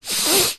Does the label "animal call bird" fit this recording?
No